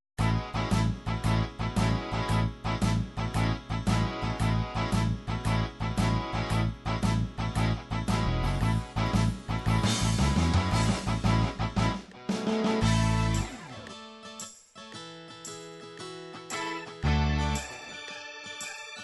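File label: ballads ballad rock shuffle, midi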